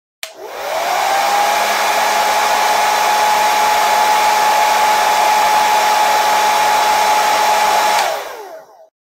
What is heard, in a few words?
Hair Dryer Sound Effect